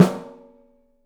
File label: OPEN STEEL SN; 3D Drums